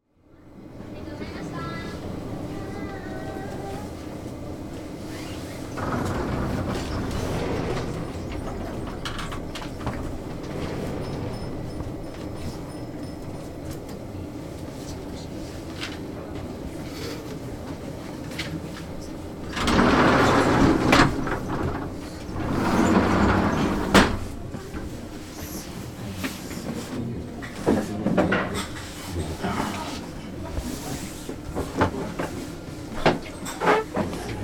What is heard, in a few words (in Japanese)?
raamenya no machiaisitu; あるラーメン屋の待合室